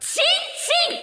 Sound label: combo break